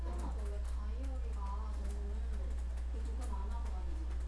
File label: rain